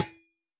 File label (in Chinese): 铜格栅 破坏声